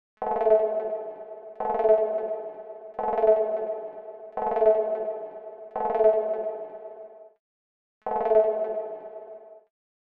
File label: alien alert noise